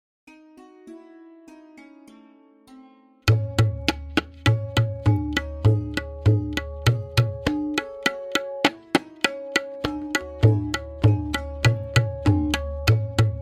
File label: Variation 10-Played with Lehra, tabla; Tintal; Benares Kayda 1